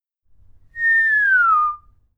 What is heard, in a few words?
changing tone